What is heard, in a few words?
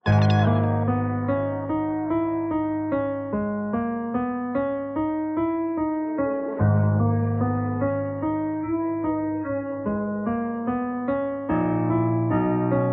Freestyle instrumental